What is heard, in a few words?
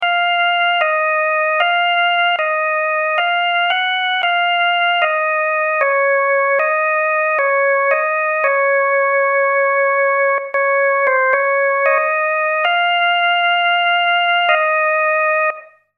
organ